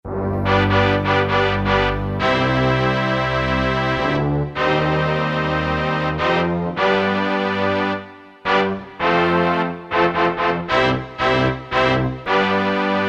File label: Brass ect; Korg DSS-1; Brasect2